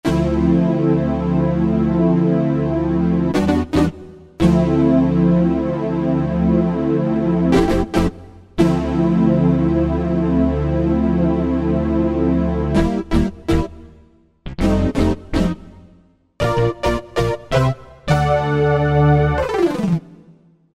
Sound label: Pop Box, Korg Wavestation